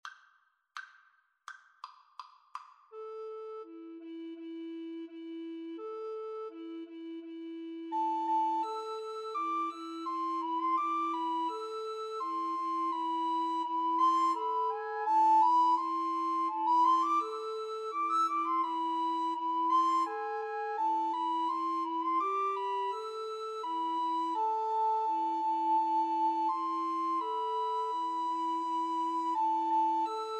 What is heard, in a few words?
patapan, Recorder Trio